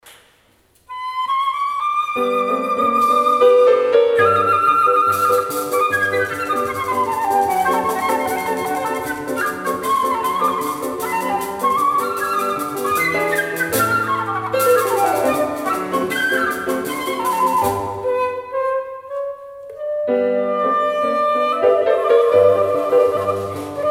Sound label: Improvisation